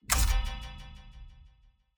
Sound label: battle preparation begin